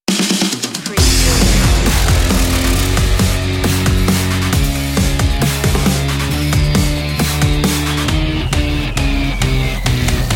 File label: no vocals; raucous